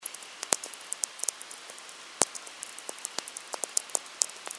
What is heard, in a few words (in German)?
Feld Hinten ATM VLF, Natural Radio